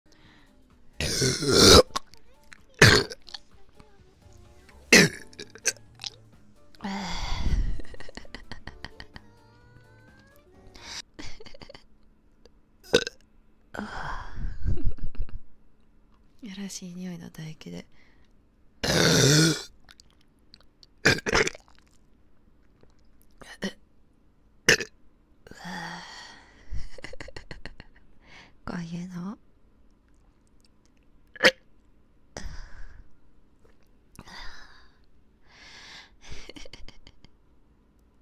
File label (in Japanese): ゲップ切り抜き